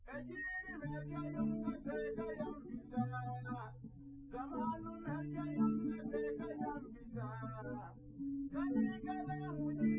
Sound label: nodrums liverec